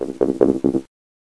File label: air turret active loop; hl2